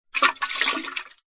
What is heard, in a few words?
liquid water in metal canister short movement splosh splash